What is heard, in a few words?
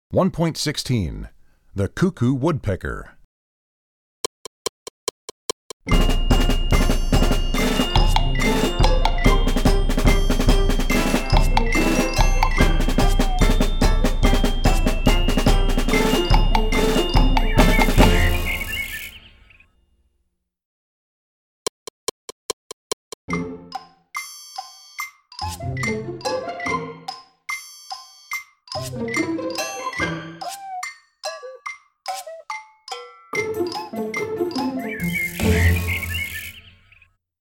The Cuckoo Woodpecker, Percussion; Percussion Book 2